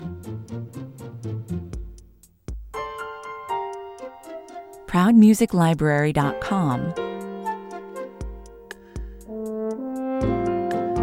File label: An upbeat, bright and cheerful pop track with light and friendly mood like a sunny spring day, 120 bpm; With a simple melodious tune, pizzicato strings, keyboard